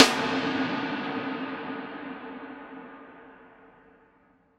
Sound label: Snare Shard; ZeroGravity Drum Shards